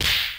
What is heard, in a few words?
Punch; RedDot